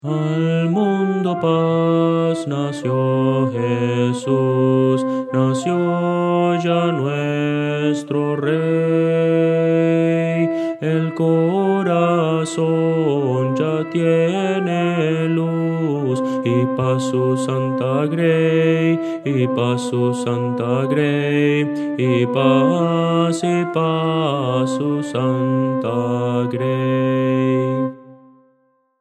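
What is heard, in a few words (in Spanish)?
contralto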